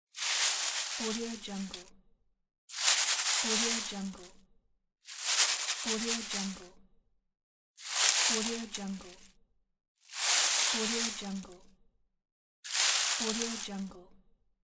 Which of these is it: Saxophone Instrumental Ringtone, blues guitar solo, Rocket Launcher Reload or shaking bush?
shaking bush